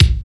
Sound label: BD, Dance Kit, Roland